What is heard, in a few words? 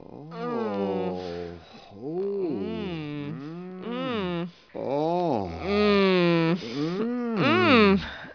people